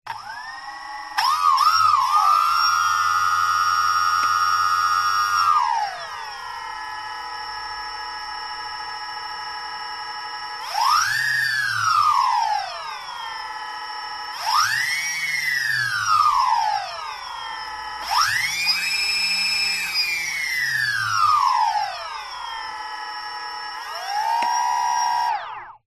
transport drone dji phantom 3 start motors run then off no props